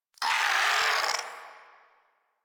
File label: Shadow Vocal Attack; Warframe; Duviri